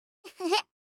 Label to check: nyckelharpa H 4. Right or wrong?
Wrong